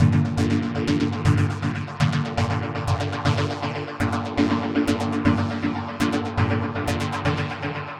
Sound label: Chords Loop Gm 120